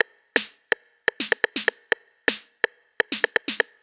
drum loop transit percussion 125, Classic Chicago House; Sample Magic; Drum Loops